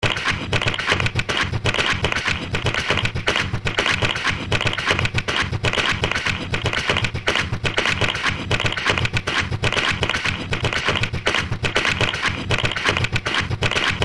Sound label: jingle